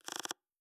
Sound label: dice roll